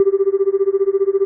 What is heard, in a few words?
Phone Call